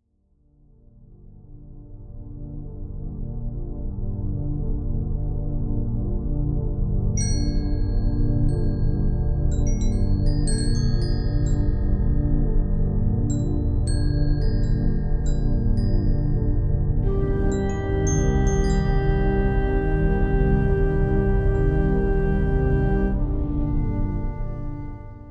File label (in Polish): muzyka bez oplat